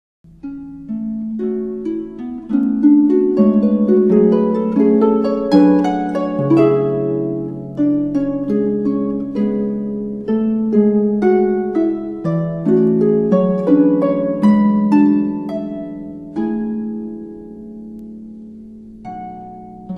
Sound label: harp